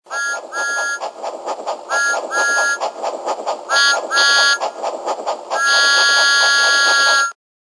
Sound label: Whistle